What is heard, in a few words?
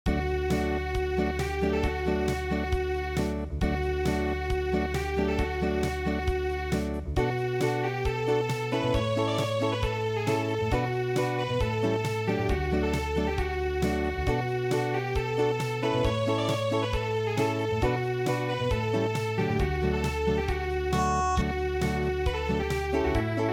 Acoustic, traditional